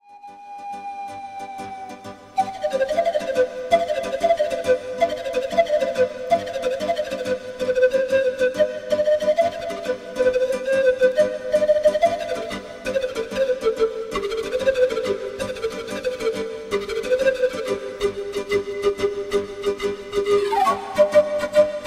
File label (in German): Rumänischer Hirtentanz